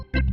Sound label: KEYS; MyMix Recordings NAMM 2018